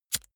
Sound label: lighter wheel spin